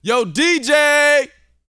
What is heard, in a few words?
VOCAL F; ILIO